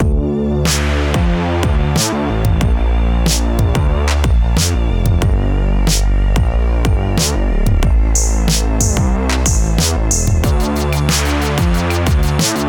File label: Bass